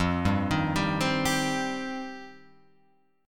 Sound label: F 7th Suspended 2nd F down Guitar Open F; F7sus2; arpeggiated; JGuitar